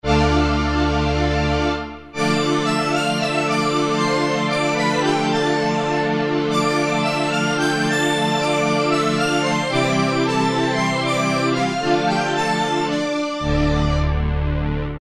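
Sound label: Syn Orchestra, Roland Alpha Juno-2; Factory B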